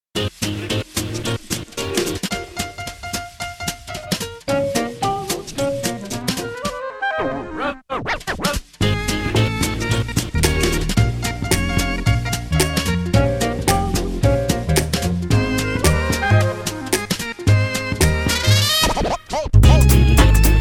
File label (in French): JAZZ LES CLAQUETTES